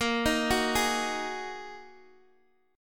Bb 7th Suspended 4th Sharp 5th Bb down Guitar Open D